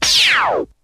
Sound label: blast, zaps and beeps